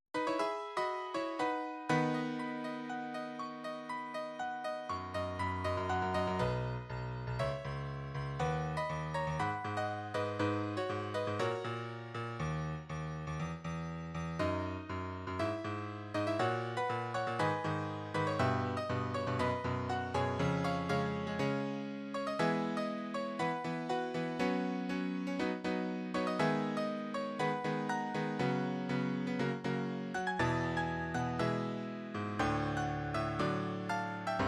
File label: theme piano; Wii Sports